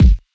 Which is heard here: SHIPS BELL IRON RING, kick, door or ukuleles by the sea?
kick